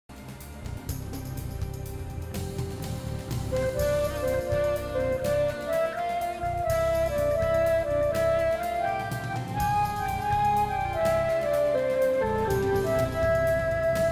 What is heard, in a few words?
Guitar